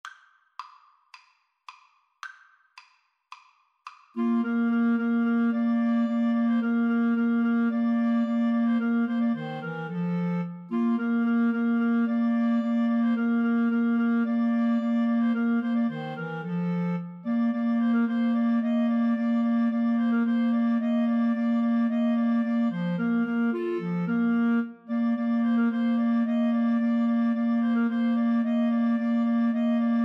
spring, Clarinet Trio

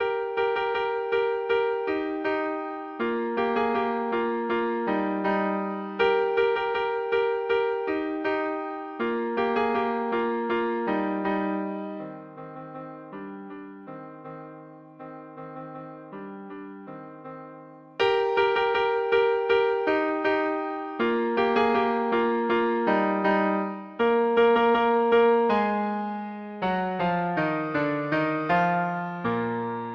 french horn duet

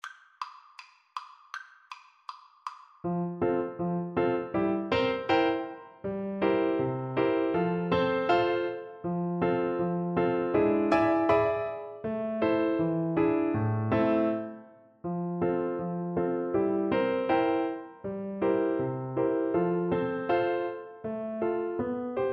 pizziccati

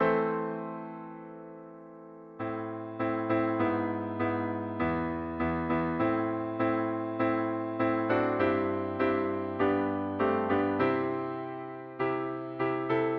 piano

